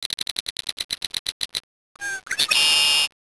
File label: blackbird red winged